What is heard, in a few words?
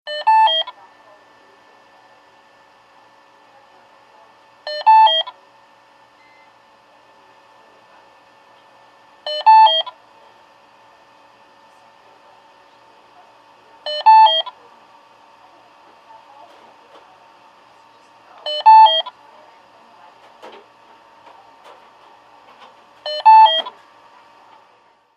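ICU Iv Alarm Background, hospital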